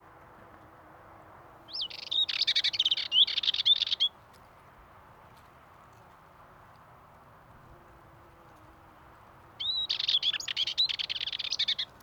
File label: Dartford Warbler Male Singing At Pornic Loire Atlantique France